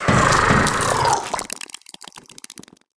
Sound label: Leviathan Climb Out